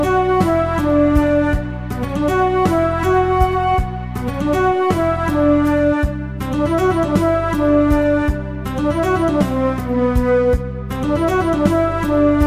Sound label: Melody